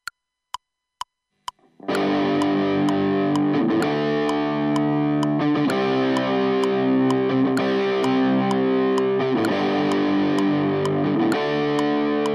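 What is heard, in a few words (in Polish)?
refren z wytlumieniami, guitar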